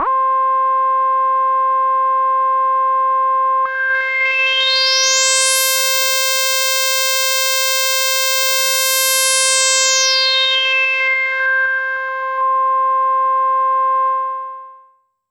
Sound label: C3, SynthLine; 90 s Sample CDs; NiceBeats; SynthLine VOL-21